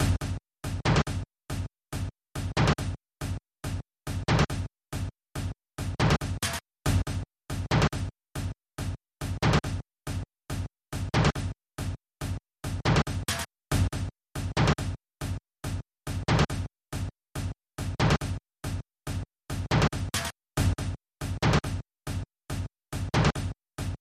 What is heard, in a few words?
Loop Layer Glitch